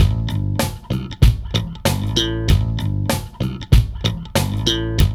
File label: LOOP, Funky Ass Loops; East West